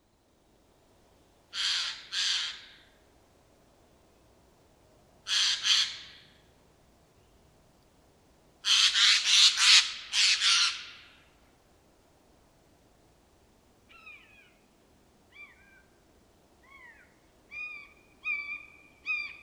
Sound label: Garrulus glandarius; SETE